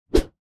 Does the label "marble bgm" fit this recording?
No